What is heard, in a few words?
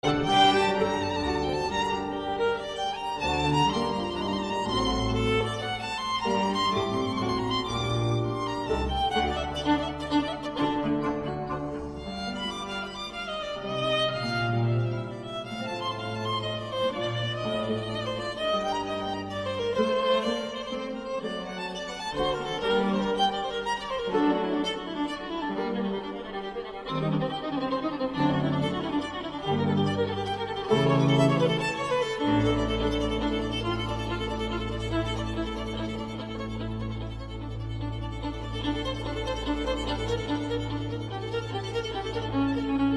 Violin; Vivaldi